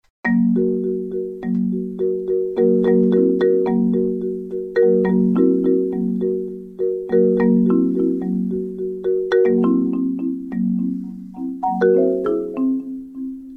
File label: whole tone marimbas